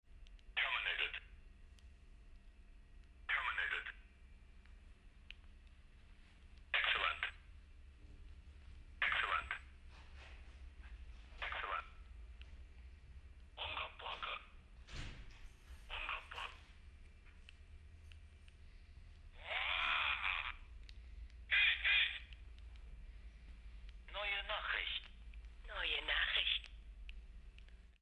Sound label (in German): Binaural Handy